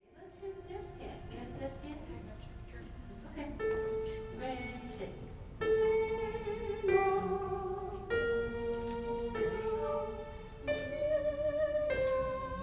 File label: refrain descant; Gather Hymnal